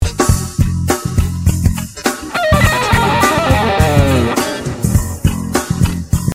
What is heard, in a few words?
Lick